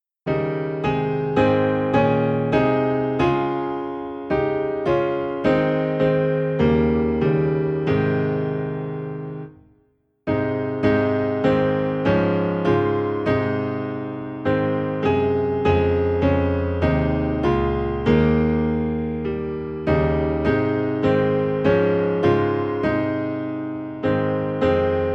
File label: Piano Low in A Flat; Lead On O King Eternal